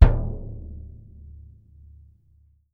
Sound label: BD MF, Percussion